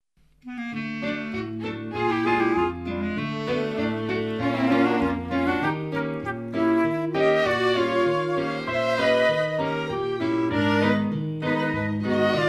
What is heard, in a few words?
Polka